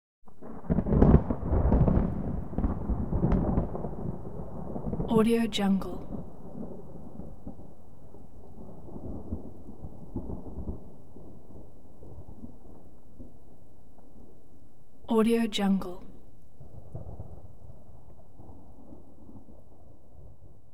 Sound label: thunder low rippling; hollywoodedge